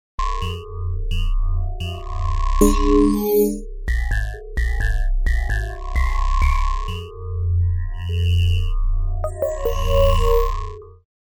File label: digifrog